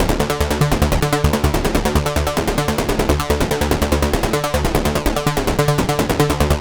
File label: Analog Synth Pattern